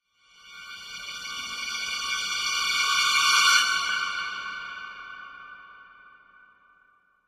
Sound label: Violins Horror Cresc; strings